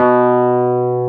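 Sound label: Wurlitzer B2, Keyboards Old School; USB Soundscan vol.09 - Keyboards Old School; AKAI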